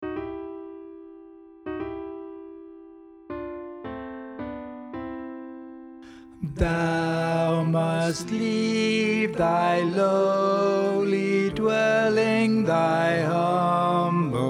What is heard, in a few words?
Shepherds farewell alto; Shepherd's Farewell in C Alto; OK Chorale Autumn Term